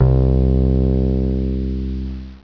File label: Bass Low; Instrmnt; Sound Library 2000; Wayzata Technology